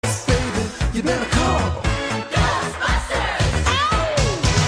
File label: song